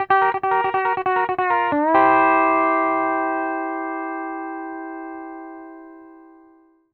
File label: LOOPS 140, Country